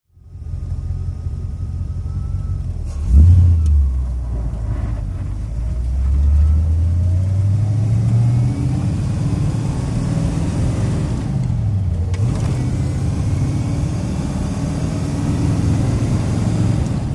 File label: Idling Accelerates, sports car